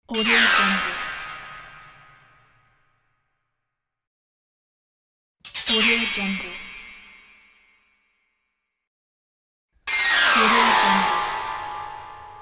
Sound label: weird transition